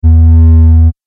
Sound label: Laser Beam Noise, Laser Sound Sound Effects